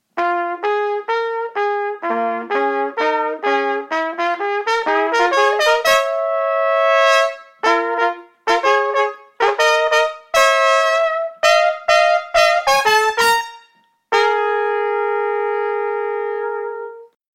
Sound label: jazz trumpet duet; TTN Jazz Duets; Jazz Trumpet Duets! (#1)